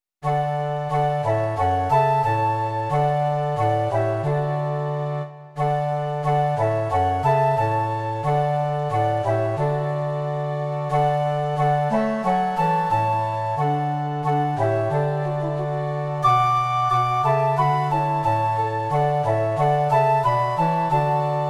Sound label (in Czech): trio fléten a fagot; Stavení překrásné; Imaginativní verze